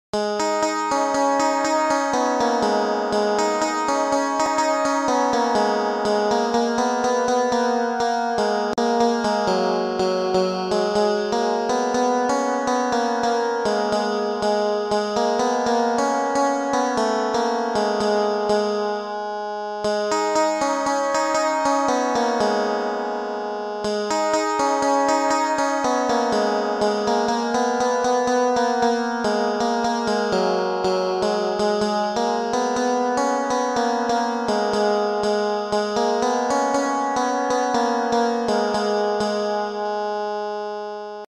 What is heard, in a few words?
on casio odia song mobile piano notes